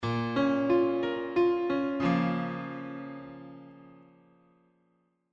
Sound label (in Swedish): Tonartsgivare Am Bbm